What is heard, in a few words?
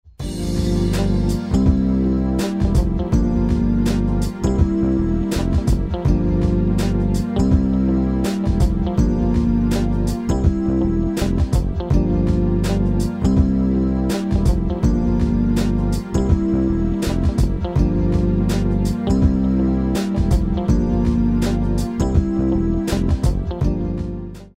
smooth jazz jam